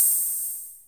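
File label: Open hi-hat, Korg Z1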